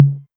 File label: tom mid, Novation Drumstation